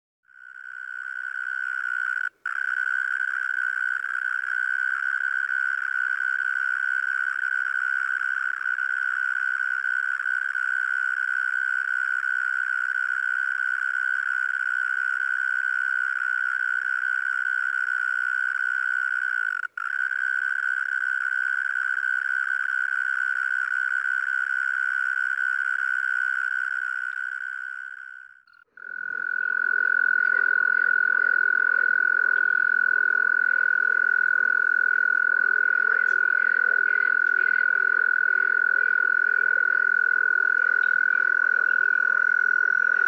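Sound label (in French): courtiliere commune gryllotalpa gryllotalpa, stridulations; naturophonia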